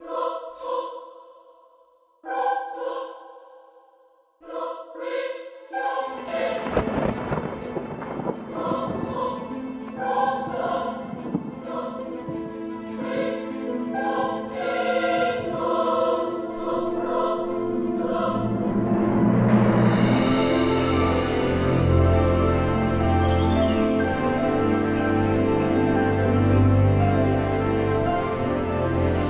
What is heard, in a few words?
ORCH MENU MUS LOOP